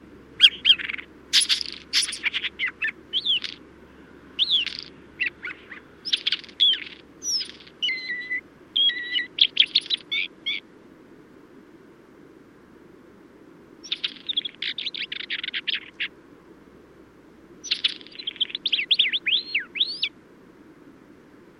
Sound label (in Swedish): Isabellastenskvatta